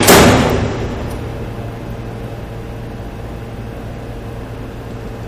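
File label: Prison Door Slam Close; jail & prison doors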